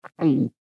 Human Swallow, Swallow Hard Male Fifty Yrs; Male Fifty Year Old; Blastwave

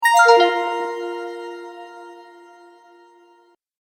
News Message, Cartoon

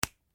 LIVE PLIABLE SNAP, Organic Foley sounds; Injuries (Sound Effects:Combat)

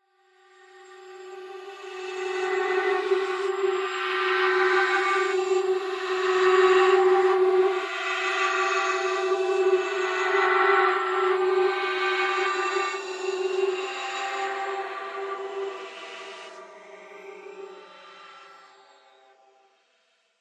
Horror Swells Vox Reverse Delay; Scary Tones; Blastwave

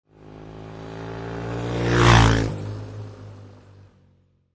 Motorcycle Dirt Bike Drive By Left To Right; Motorcycle motor. Motorbike type offroad Dirt Bike

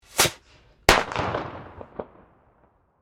Fire, Firework, Bottle, Rocket; Fireworks

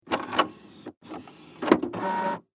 Office Fax Machine Turn On Lexmark X4270; Fax Machines

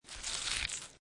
Snow And Ice Ice Subtle Movement; Sound Effects:Weather

